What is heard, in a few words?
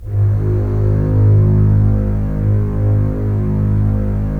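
Strings contrabasses; Roland - LA Composer vol. 4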